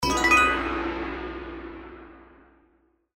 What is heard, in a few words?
Symbol Regular Win; Kungfu Showdown